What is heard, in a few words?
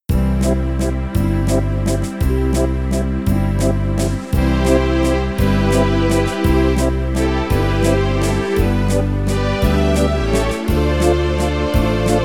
Waltz; Sleeping Beauty